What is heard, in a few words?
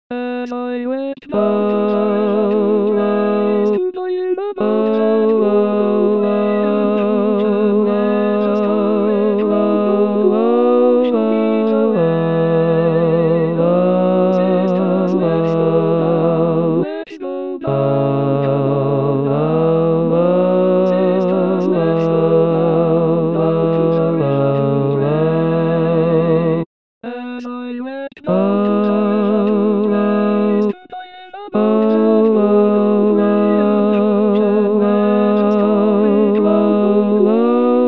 Basses